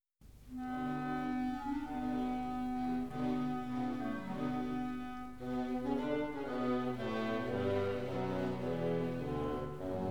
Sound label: B minor Cello Concerto; first movement (Dvorak); Legend of Galactic Heroes; Free Planets Alliance SIDE